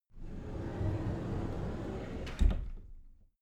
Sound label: cupboard slide door open soft